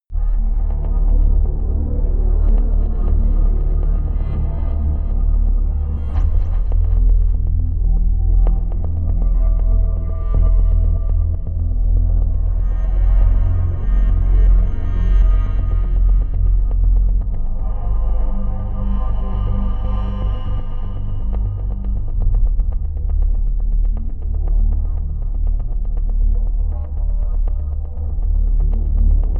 drone reversed scratches

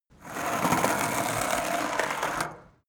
skateboard indoors drive light; sport freizeit

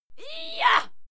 female fight scream yah; schreie; menschen

